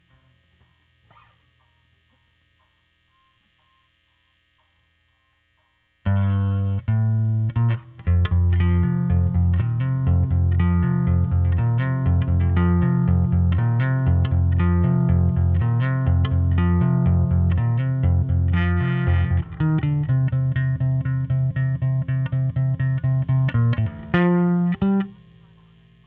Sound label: Bass OD